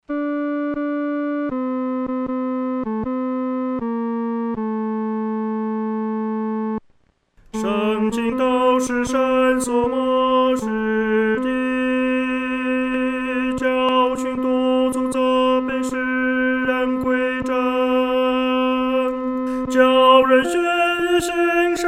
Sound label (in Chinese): 独唱 第三声